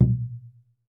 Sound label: PUEBLO, American Natives; E-MU Formula 4000 Series Vol. 4; Earth Tones